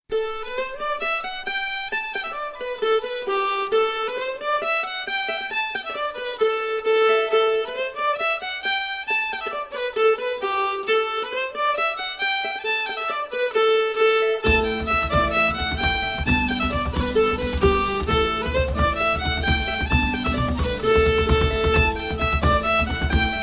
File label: Polky ukazka